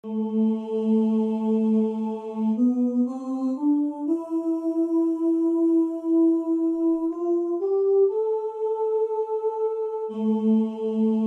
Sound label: air vocalise tenor